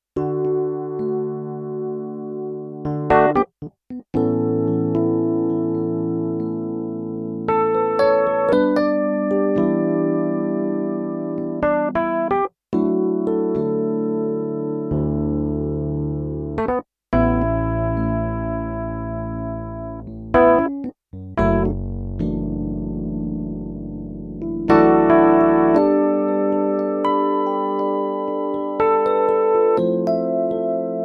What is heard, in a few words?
E Piano